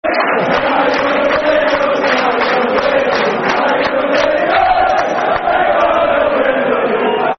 Football Chants (Soccer Songs); We're Gonna Win The League; Man City Mcfc

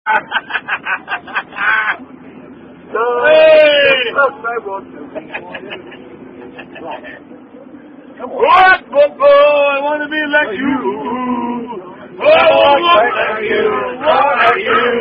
Rugby Song; The Monkey Song

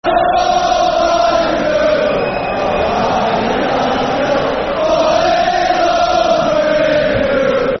Football Chant; Soccer Songs; fanchants; Huddersfield Town Htfc

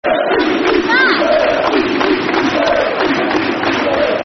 Teessiders Football Chants (Soccer Songs); Middlesbrough

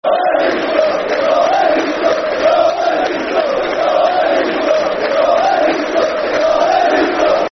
We All Hate Leeds Scum Football Chant; Crewe Alexandra Cafc

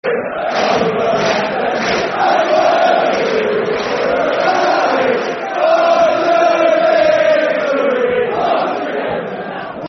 Football Chants; We All Follow The Palace; Crystal Palace Cpfc

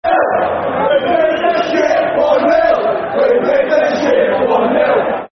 Football Chants (Soccer Song); We Beat The Shit 1-0; Wolves; wolverhampton wanderers; fanchants

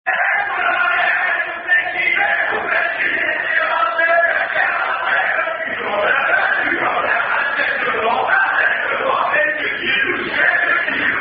Chant French Rugby Chant; Chant Efsc

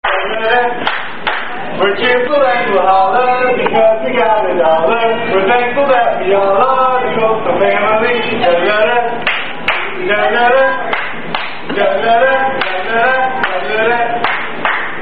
Coldstone Song [Tune ]

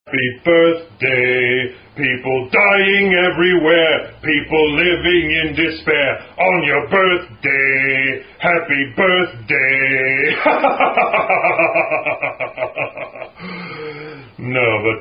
Happy Birthday (Tune Volga Boatmen); Happy Birthday By Satan

